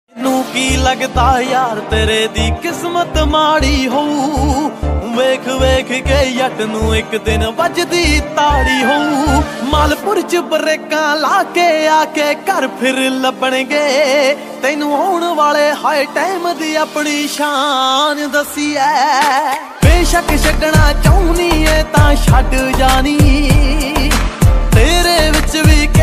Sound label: Punjabi Beats Ringtone